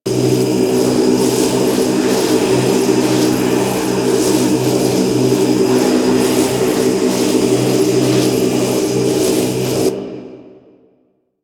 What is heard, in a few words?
Thurible Loop; Warframe; Priest